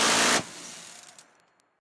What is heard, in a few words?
gun; mcheli